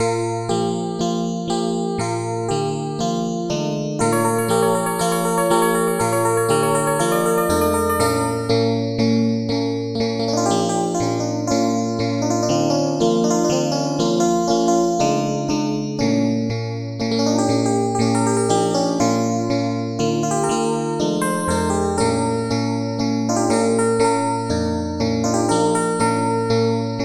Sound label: 10EDO; Joyous Thing